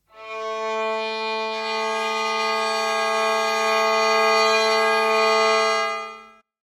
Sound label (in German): geige